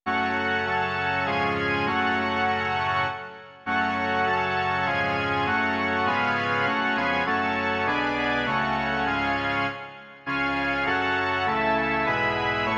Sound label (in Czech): varhany